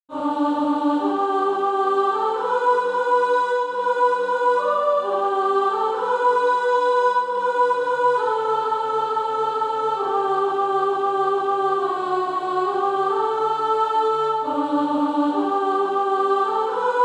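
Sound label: soprano, Sheet music (SATB) including Practise Music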